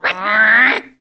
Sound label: Bird Green Flying; fowl